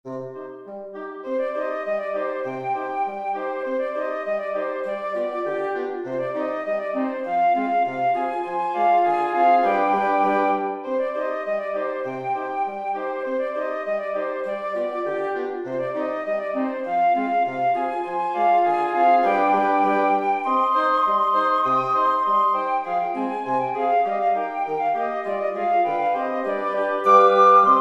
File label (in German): blaeserquintett